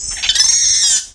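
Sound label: creature dalyrake attack heavy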